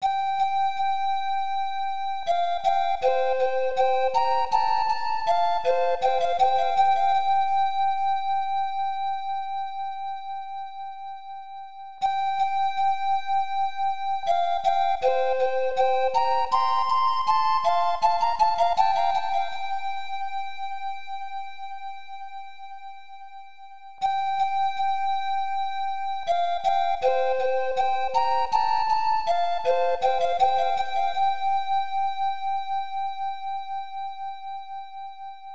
Flute; Estpolis Denki 2; Lufia 2 Rise of the Sinistrals; snes; Flute of Sleepwalk

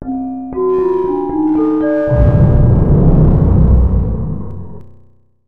Tada; Heisei Shin Onigashima; snes

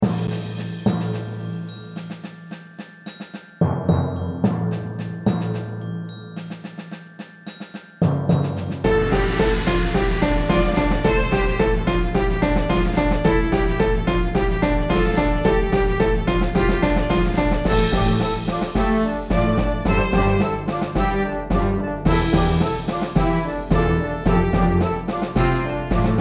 Orchestra

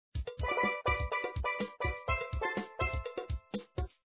boombox music; papa s freezeria gamerip

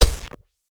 Item Pickup

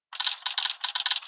character name

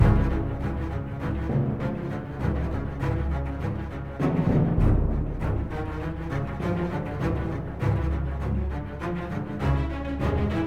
Music Shooting Gallery Light Loop; Battlefield 1

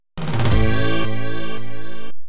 Burn Rubber Points, pinball; Getaway: The High Speed II; Williams; arcade

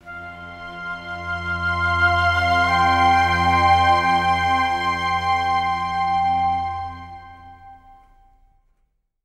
Ambient; Rise of Nations: Rise of Legends

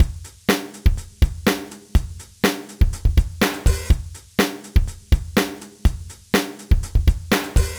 Music Mini Game Guitar

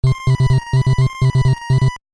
Train People; genghis khan nes

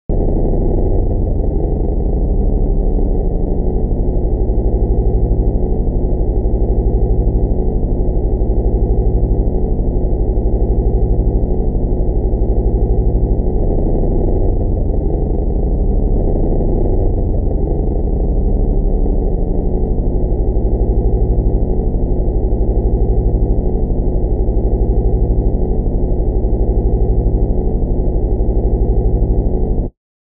Radio; Milk outside a bag of milk outside a bag of milk

